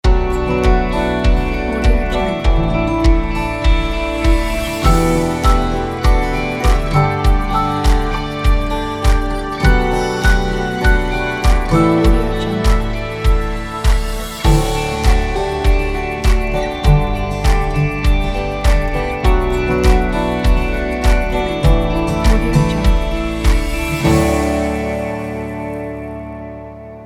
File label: inspiring romantic folk logo pack